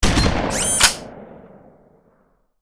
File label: remgun fire; Original TK Sounds